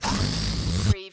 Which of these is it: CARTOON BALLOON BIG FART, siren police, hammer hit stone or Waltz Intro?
CARTOON BALLOON BIG FART